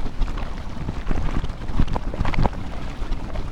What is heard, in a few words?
Bouill fast Move; Puddle Plus